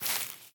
grass step; Golden Crayon Sounds

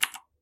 keyboard type; Golden Crayon Sounds

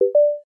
timer start; iOS Sounds

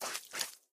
mud step; Golden Crayon Sounds

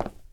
concrete step; Golden Crayon Sounds

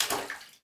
sewer step; Golden Crayon Sounds

